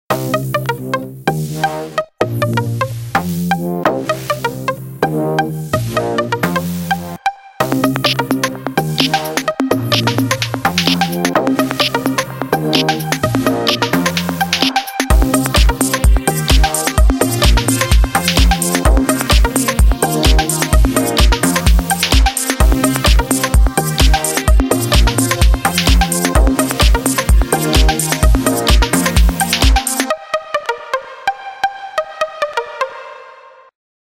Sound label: Retro Robots, Nokia Tones; Windows Phone apps